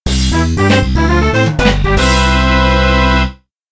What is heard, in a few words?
2nd 3rd Goal; MKDS final